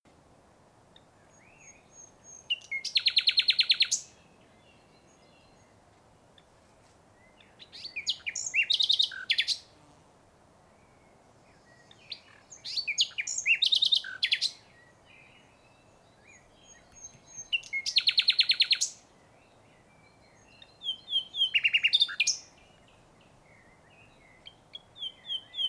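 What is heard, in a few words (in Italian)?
canto verso usignolo luscinia megarhynchos nightingale nachtigall; bioacustica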